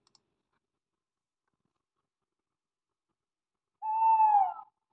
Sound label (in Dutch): bosuil, animal sounds